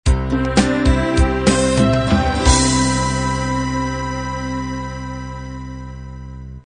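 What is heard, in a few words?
unplugged 8 beat end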